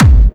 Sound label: Clubby Kicks, Bassdrums